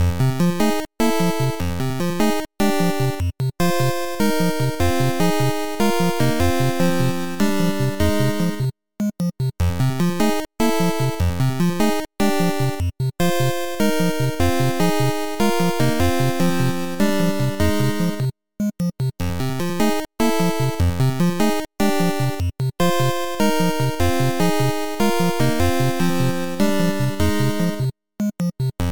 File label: underground; ddp